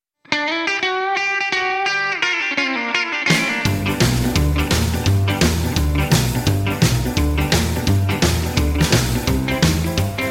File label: G No Backing Vocals, backing tracks